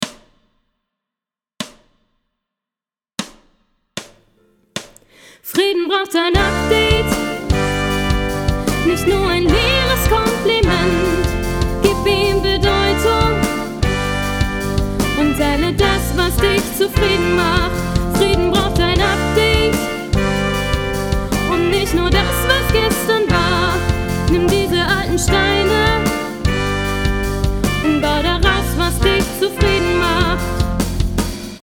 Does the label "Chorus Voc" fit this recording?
Yes